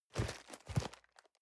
cardboard box medium turn; objekte

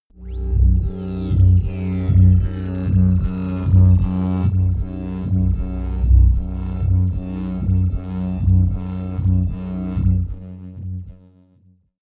drone bass bubble; musikelemente; drones

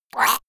monster choking high; koerpergeraeusche; comedy-cartoon; soundpool

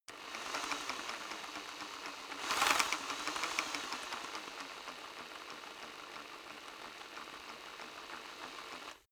rock drill hammer rev very long soft; maschinen; bohrer; soundpool

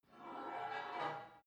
take off weight barbell reverby, fitnessstudio

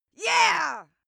female cheer

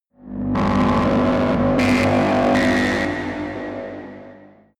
sci-fi cosmic strong dark energy, horror

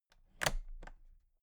toolbox latches close soft; industrie wirtschaft; handwerk

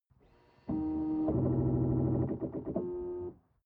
sports car ext ignition onoff; verkehr-transport

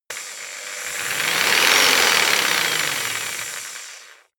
jigsaw engine medium fast short

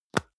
deo can lid open hard

